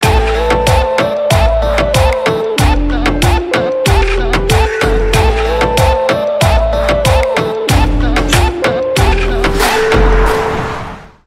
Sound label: Bengali Instrumental Ringtone